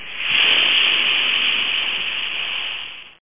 large Snake; AmigaMUD

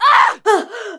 Woman Kill Assault; gangsters; English

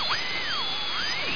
DRILL; MACHINES

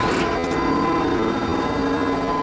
ship under open; Earth and Beyond; EA Games

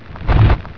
fly big, imp; Hexen II

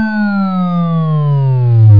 alarm; Terra; TERACIDE

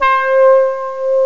brass horns

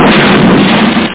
hit expl; Tank Attack (1989)

